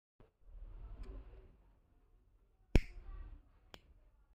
gas lighter; فندک گازی

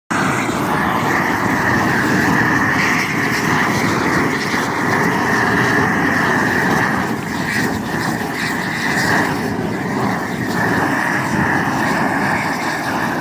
isogaming

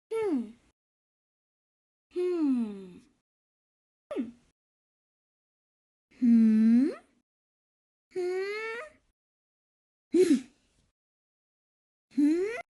"hmm"; هوم

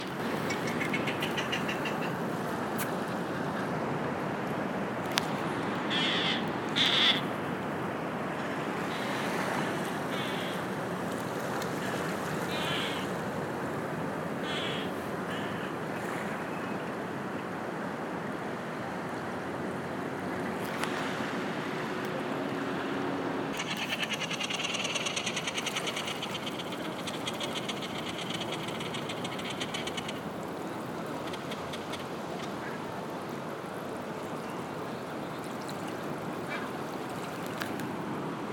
Inca tern

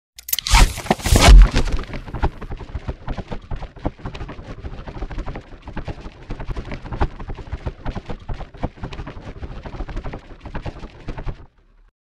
Parachute; چتر نجات

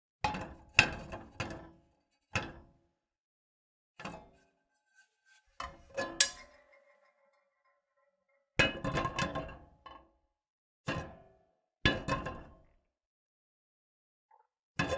Put pot on stove

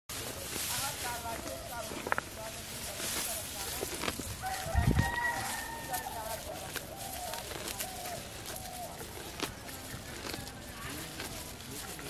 Cow eating grass; علف خوردن گاو

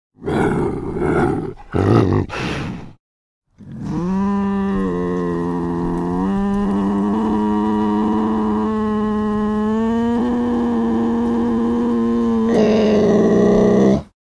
iberian lynx; لینکس ایبری

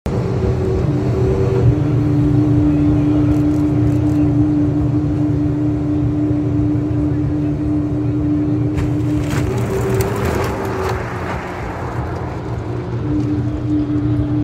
Rimac Nevera; ماشین

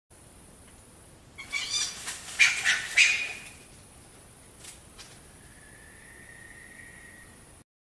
Martens; سمور

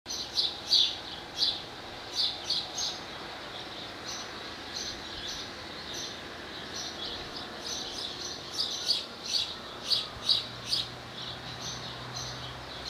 swallow sound

